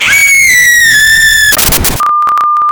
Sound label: Scary Scream Cut In Static